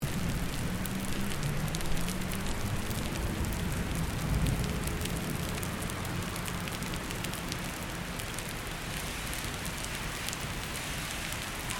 Rain pavement city; Weather

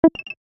UI Rollover Major, User interface feedback sounds; Computers; Electronics

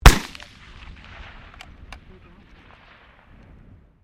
RIFLE 6MMBR FIRING; Rifles, non military; Rifle Sound Effects; Rifles (Sound Effects:Guns)

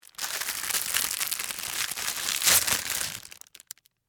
Wrapper Open, Foley, Plastic; Crinkling (Sound Effects:Objects); BlastWave

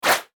FLASH ELEMENT HIGH TECH

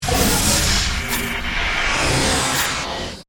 Scanner Beam Heavy, Scifi; Science, Fiction; Tech

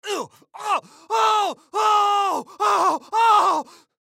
Human Scream; Scream Long Multiple Painful Ow Male Fifty Yrs; Human Scream Long Multiple Painful Male Fifty Year Old; Screaming

